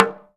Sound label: TALK DRM, Heart of Africa; Spectrasonics